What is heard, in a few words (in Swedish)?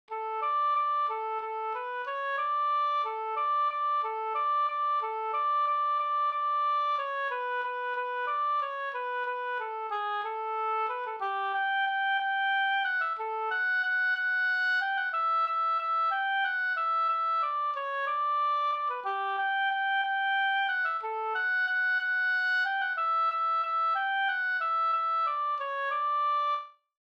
folkdans familjevals; Gammal familjevals NSGf010